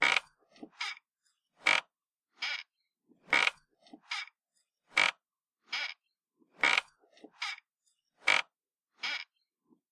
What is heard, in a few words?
Swings Sound